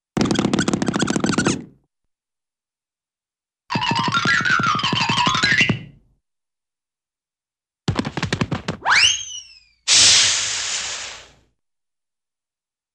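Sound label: Rapid Hits with small Squeaks footsteps, Cartoon; Hollywood Edge; Cartoon Trax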